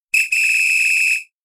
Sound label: percussion